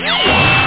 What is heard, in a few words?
whirr; SCI-FI; apc mission